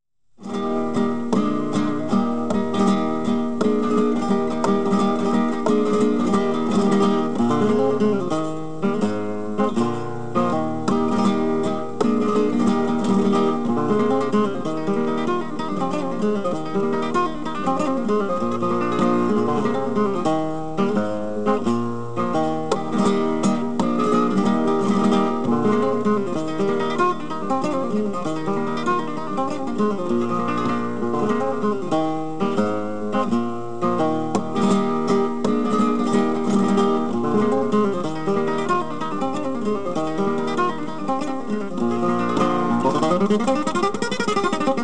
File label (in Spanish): Milonga sevillanas; Sabicas